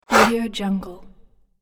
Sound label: wood game piece slide